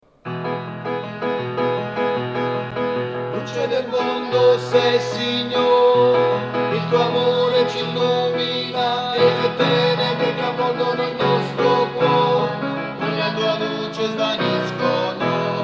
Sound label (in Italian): Luce del mondo (tutti); canti; registrazioni